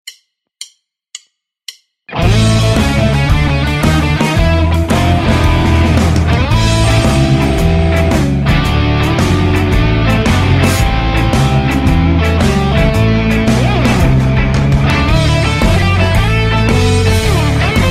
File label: Blues rock